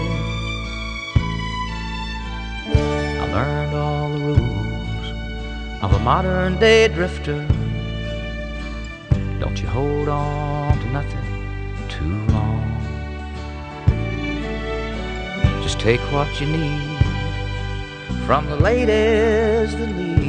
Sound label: country